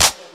hip hop clap sound c key; single hits one shots

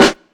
clean steel snare drum g key; snare drum samples

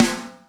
snare sample a sharp key; snare drum samples

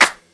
smooth acoustic snare b key

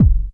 round steel kick drum sound F sharp key

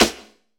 Reverb Snare D Key; snare drum samples

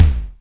mid tom sound d sharp key; tom drum

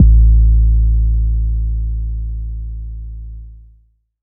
phonk kicks oneshot - 808 A, Trap kick drum; trap kick drums

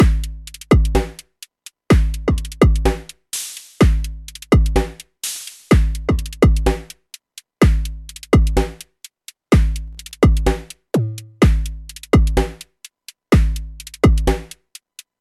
drum sequence analog broken beat am 126; drum loops

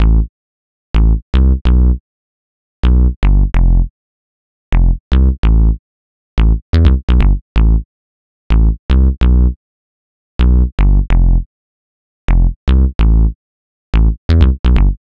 techno melodic bass detroit; instruments; loops

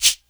dry shaker percussion G key; tuned percussion

